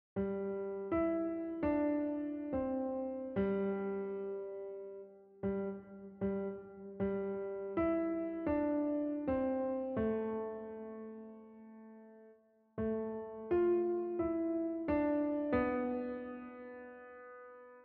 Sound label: JingleBells